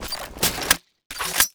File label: frag grenade equip